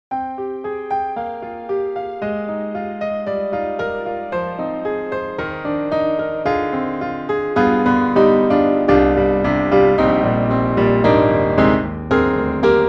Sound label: Grand Pianos Bosendorfer Imperial Grand and String Pad; Synthogy; IvoryII